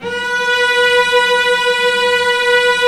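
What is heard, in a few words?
cellos, vibrato, mezzo forte; String Master Series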